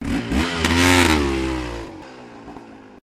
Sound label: engine finish